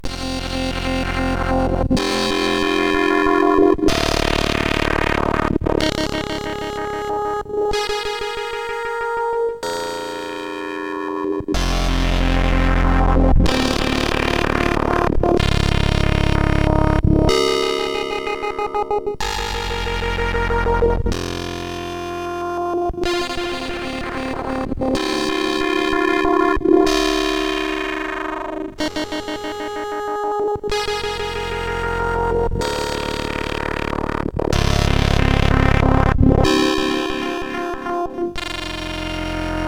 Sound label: Ambience, sid CSG8580R5